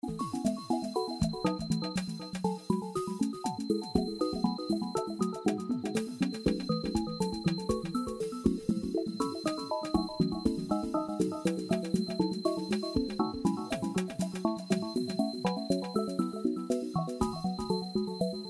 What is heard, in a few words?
8192Hz